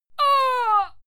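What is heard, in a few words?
female fight pain, screams; schreie; menschen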